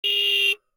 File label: horn motorbike; Blind Drive